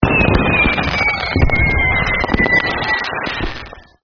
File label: fireworks, ambience